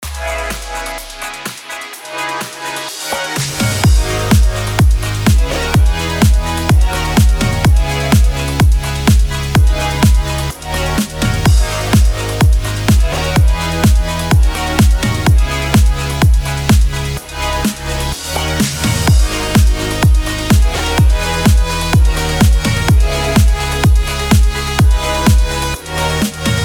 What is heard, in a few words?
BED EDM G#MIN 126BPM